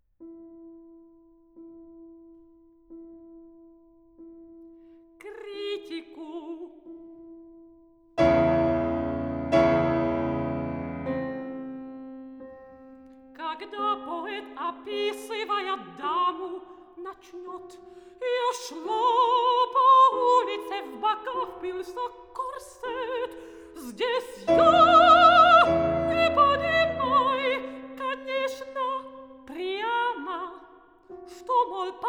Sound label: for soprano & piano; Satires (5); 1. Kritiku (To a Critic)